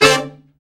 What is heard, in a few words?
BRA SECT; BRA SECTIONS